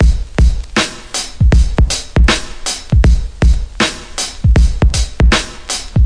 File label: 079 bpm noisy vinyl loop, drum loops and breaks